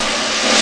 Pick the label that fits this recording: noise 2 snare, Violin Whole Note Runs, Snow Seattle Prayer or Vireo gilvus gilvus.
noise 2 snare